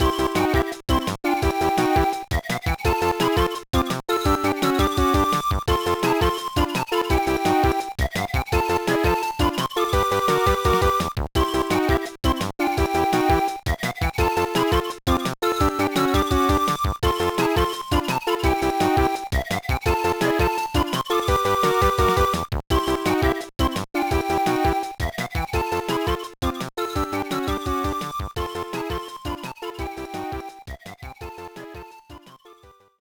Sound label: BGM; Sylvanian Families Fashion Designer ni Naritai Kurumi Risu no Onnanoko; GBA